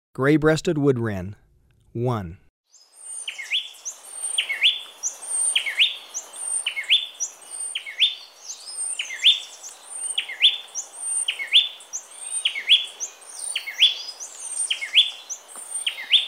Grey-Breasted Wood-Wren

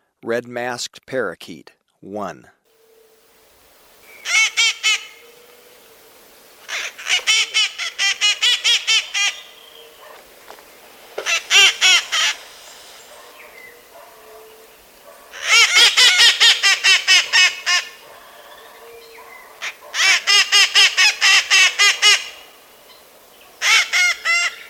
Red-Masked Parakeet

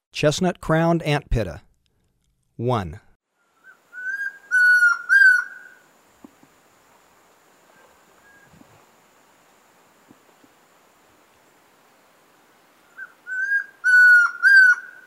Chestnut-Crowned Antpitta

